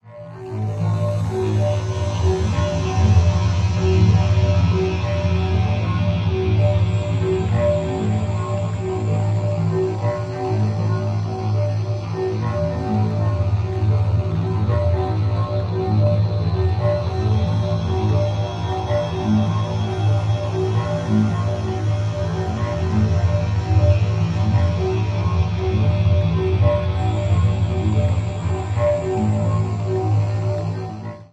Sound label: Ion Engine Mechanical, mechanical